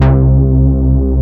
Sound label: ODY PWM, ARP Odyssey